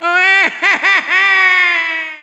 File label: death; waluigi